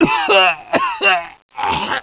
death; fgrunt

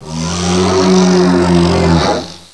geneworm shot in eye

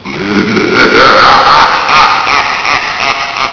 zombie alert; svencoop; schall

